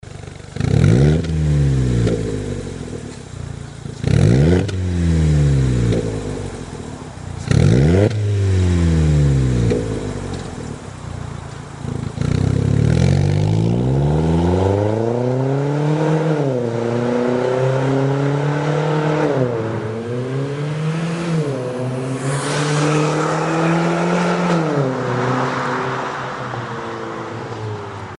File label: magnaflow exhaust systems